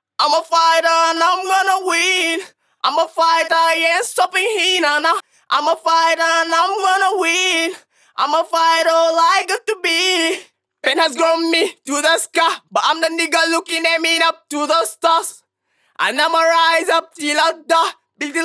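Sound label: hip hop, E minor, 135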